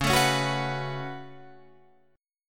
C sharp 7th Suspended 4th Sharp 5th, C sharp, down, Guitar, Open F; strummed